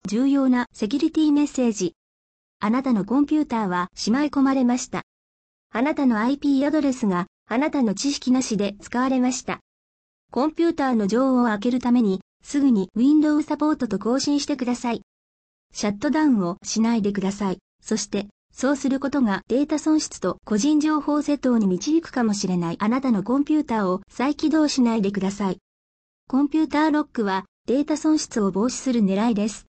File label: Fm7 alert